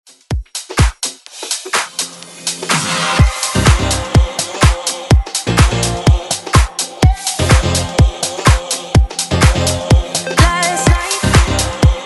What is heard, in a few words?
Dance Redrum Clean